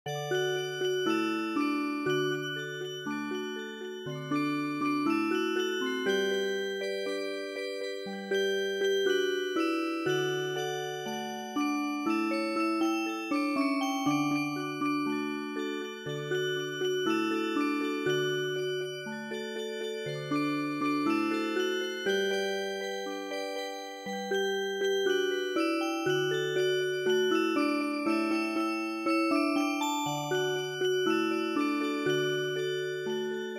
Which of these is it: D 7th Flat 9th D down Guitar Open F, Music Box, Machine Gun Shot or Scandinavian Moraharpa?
Music Box